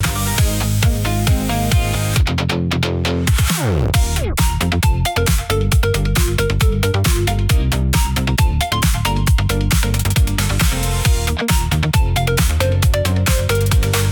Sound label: loop